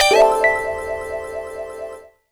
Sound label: M SPRINK, Akai MPC2500 drum machine